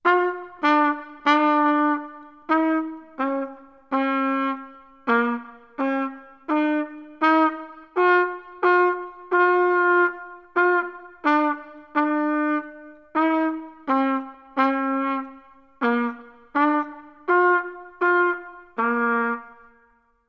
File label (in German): anfaenger trompete; musikschule; instrumentalunterricht